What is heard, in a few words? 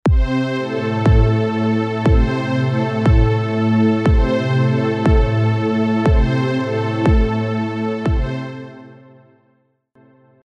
Original Pad